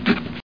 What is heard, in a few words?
draw circle; ProOne: Netcracker Netscape Navigator